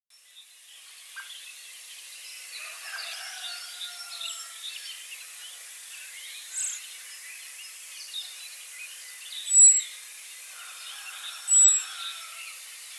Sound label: Turdus merula; SETE